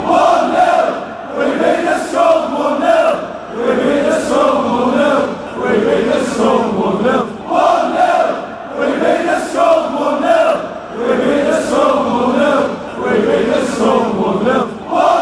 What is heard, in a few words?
sung to lyric only, fanchants; we beat the scum 1-0; man u